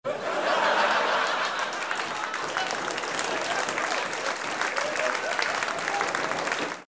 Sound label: laugh applause